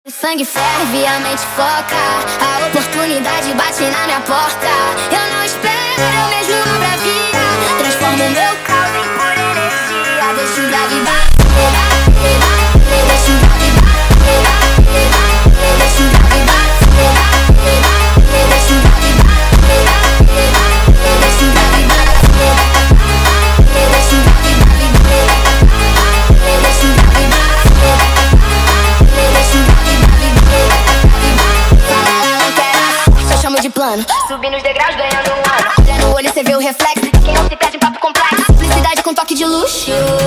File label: Grave Grave speed Up, funk